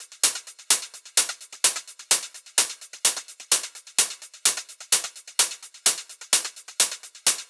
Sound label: Drum Loop Top 128, Melodic Techno; Unleash